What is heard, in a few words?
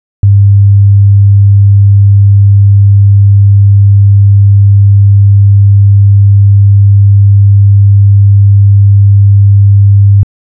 100Hz Sine Wave; CD Test Tones